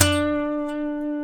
E, funk guitars; Spectrasonics; Hans Zimmer Guitars VOL-1; TAKA FUNK

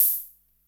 Vermona DRM1

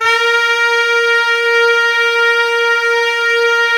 ALTO SAX B, SLOW SAX; Giga Samples Collection

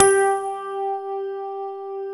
GRAND PF G 3, AKAI S-Series

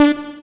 SYN Poly D; Best Service Gigapack 1

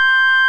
FARF COMBO C4, Electric Organs; E-MU Formula 4000 Series Vol. 3 – Analog Odyssey

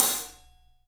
Studio Hats, 3DPercussives

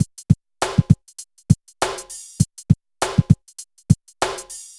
BPM100 SYN; AKAI S-Series CD-ROM Sound Library VOL-4

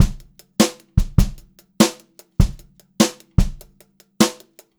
JZ BEAT, Brushed Drum Loops; USB Soundscan vol.39; AKAI

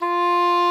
OBOE B4, REEDS; Greytsounds VOL-1

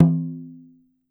DRUM, Ritual Drums; E-MU Producer Series Vol. 7 – Old World Instruments; Drums&Percussion

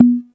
CONGA SHELL; Greytsounds VOL-1

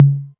tom mid, Novation Drumstation

